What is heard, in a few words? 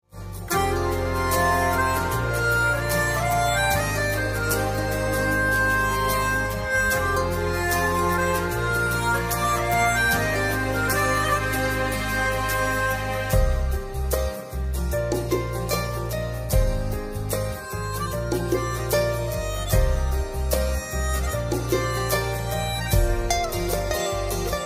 instrumental music ringtone